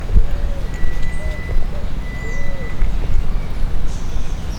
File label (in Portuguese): maria-faceira, aves